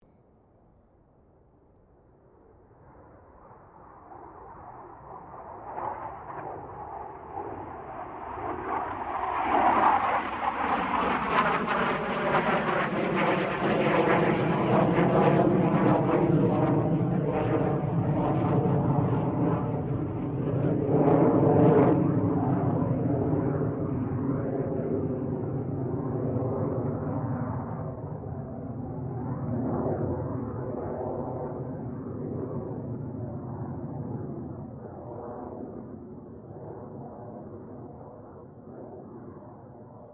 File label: AIRCRAFT CA-27 SABRE PASS STEREO; CA-27/F-86 Sabre; Airplanes; Vehicles; Aircraft Collection